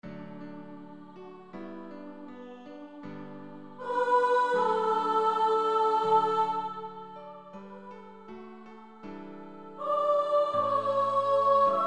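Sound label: with Piano; Female Choir; SSAA 4-part; 2nd Soprano; Somebodys Knocking at Your Door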